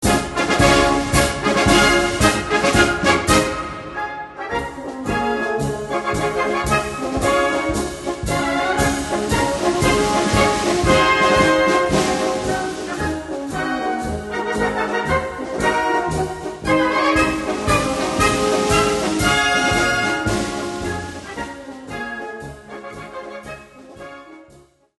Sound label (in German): fliegermarsch